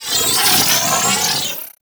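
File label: Missile Swarm Projectile By; Warframe; Corpus; Corpus Railjack; Spec Ops Arachnoid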